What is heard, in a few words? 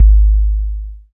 BAS FM DX-7